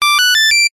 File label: technology electronic device beep tone success finished; zapsplat